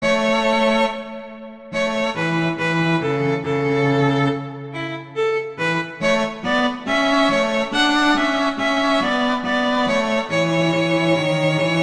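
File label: Duet, horn; Mozart; Duets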